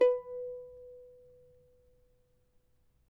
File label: charango B3; Spectrasonics; Hans Zimmer Guitars VOL-2